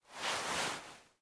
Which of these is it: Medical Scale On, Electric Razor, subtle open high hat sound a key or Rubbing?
Rubbing